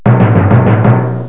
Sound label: Kettledrum; psion cd vol1